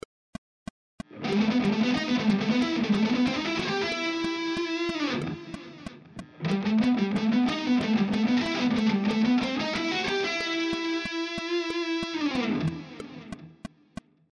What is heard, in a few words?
picking exercises melodic phrases